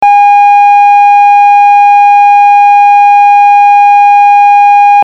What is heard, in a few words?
816 Hz overdrive warm; hört sich der Ton etwas anders an, jedoch nicht unangenehm; viele Obertöne zur Grundschwingung hinzugekommen